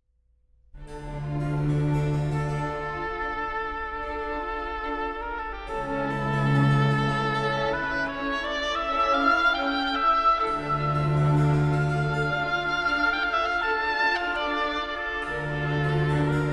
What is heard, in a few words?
Oboe Concerto In D Minor